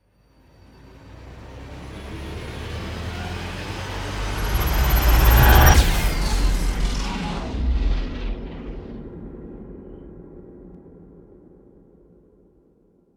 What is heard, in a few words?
Cinematic riser futuristic